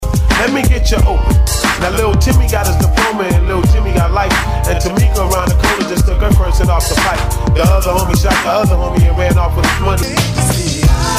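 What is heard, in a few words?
Old School Hip Hop Megamix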